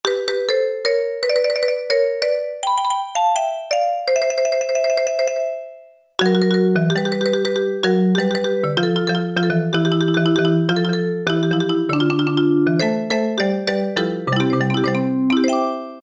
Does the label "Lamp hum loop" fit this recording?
No